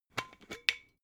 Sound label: glass bottle massive open cap